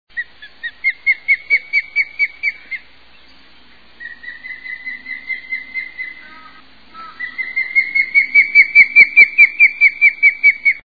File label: Osprey